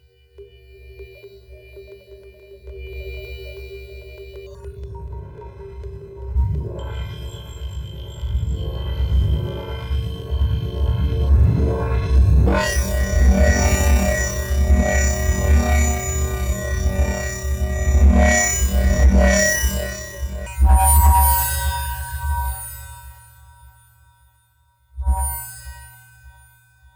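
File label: pitch shift delay sample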